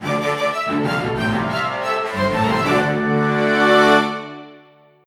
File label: 1st Place Jingle; Nicktoons Nitro; arcade